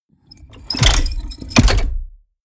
mechanics large lock squeak; industrie-wirtschaft; mechanik

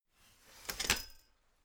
folding chair unfold medium; objekte; stuhl

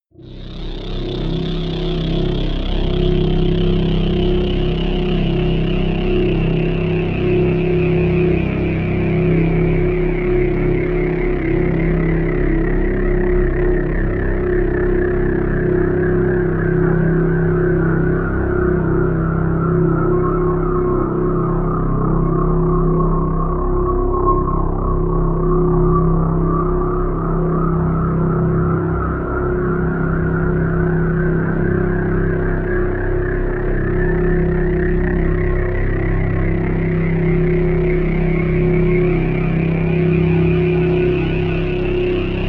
drone swirling chopper

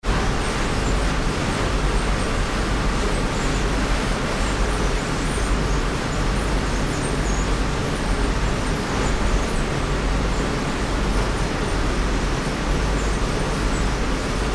ventilation fan, industrie; atmosphaeren umwelt

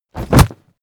body fall concrete leather; krieg-waffen; nahkampf; soundpool

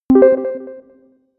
notification bleep; signale

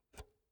pull out xlr multi socket soft; objekte; elektronik

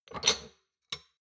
shot glasses grab multiple soft, gastronomie; berufe; soundpool

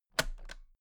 toolbox latches close heavy double; industrie wirtschaft; handwerk

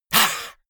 monster hiss; comedy-cartoon; soundpool

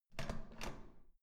door handle use

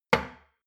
shot glass place table hard, gastronomie; soundpool

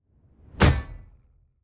sci-fi ricochet metal

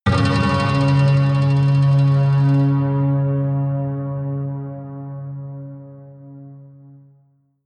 synth hit rattle; whooshes rises

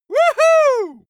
male cheer victory woohoo; schreie; menschen

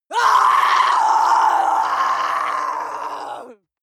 female lunatic scream hard; schreie; menschen

